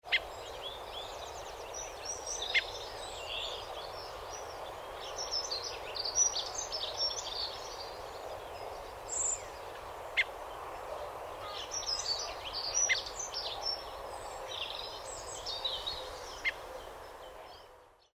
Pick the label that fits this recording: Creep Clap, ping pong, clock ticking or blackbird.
blackbird